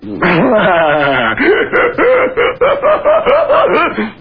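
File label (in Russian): смех мужской тупой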